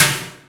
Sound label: TRASH, INDUSTRIAL